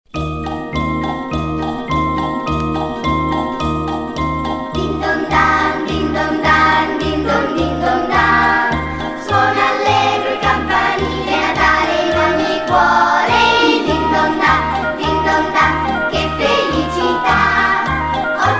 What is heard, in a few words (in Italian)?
Din don dan Jingle bells